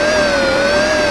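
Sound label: welder broke loop; movers objects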